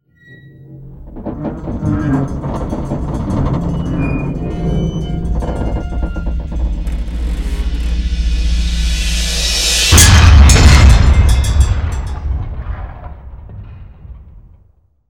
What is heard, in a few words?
Powerful Metal Boom